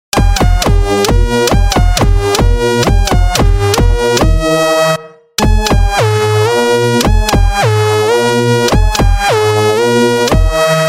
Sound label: new nokia ringtone; sahre mario